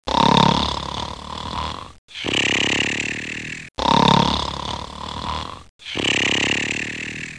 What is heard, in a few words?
snoring